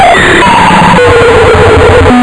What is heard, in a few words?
SPAC BIRD